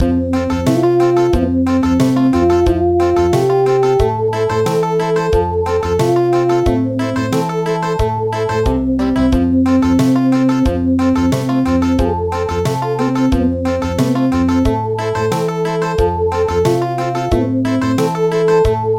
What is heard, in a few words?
Country; A duck